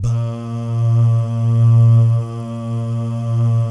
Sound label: BAH GUY, BAHS GUYS